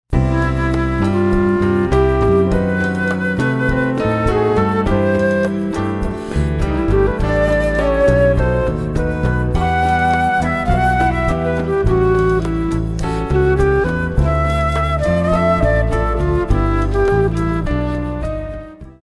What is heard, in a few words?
Latin Dance